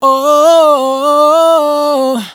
riff long oh Am, Dry Vocals; Raw Vocals; Slate Digital Omnivox Vocal Sample Pack

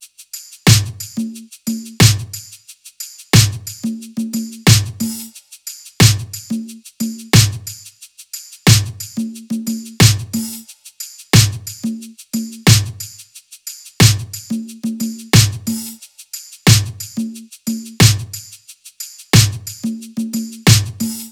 top 90, Drum Loops; SD MIDNIGHT Sample Pack; Slate

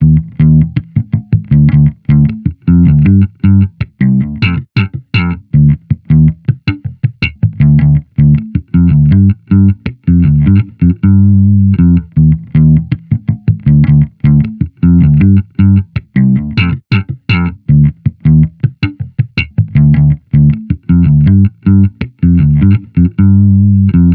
Amped loop 79 Gm, Electric Bass Loops; Slate Digital BASSHOLE Sample Pack

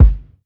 kick pillow; Drum Sample Pack; One Shots; Slate; SEISMIC

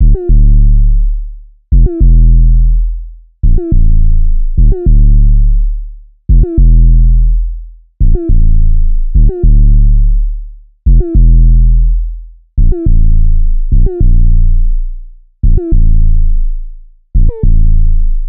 Synth loop 105 Dm, Synth Bass Loops; Slate Digital BASSHOLE Sample Pack

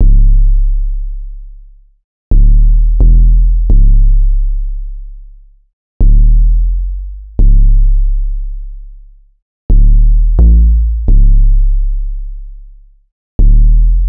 808 loop 130 C#m; Slate Digital BASSHOLE Sample Pack; 808 Loops

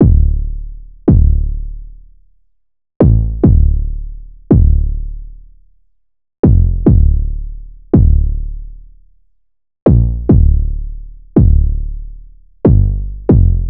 808 loop 140 Dm; Slate Digital BASSHOLE Sample Pack; 808 Loops

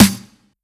Snare Ringer, 909 Snares; Slate; Vintage Drum Machines Volume 3 909 Edition

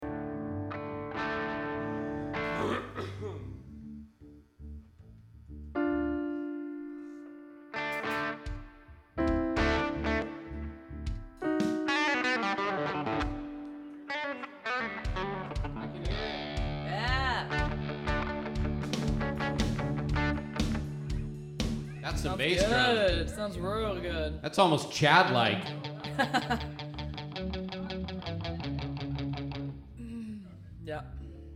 vocals

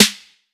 808 Snare; Slate; Vintage Drum Machines Volume 2 808 Edition

